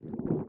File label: Hunger